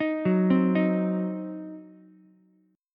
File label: Eb Minor 6th, down, Ukulele; Ebm6 arpeggiated; JGuitar